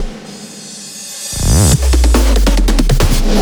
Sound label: Fills, Loops 140 BPM